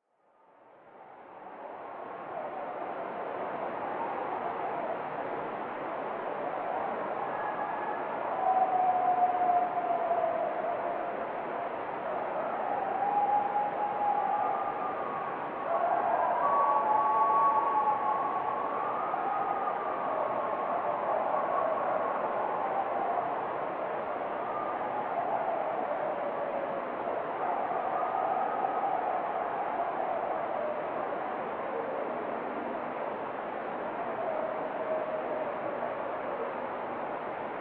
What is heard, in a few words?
coyotes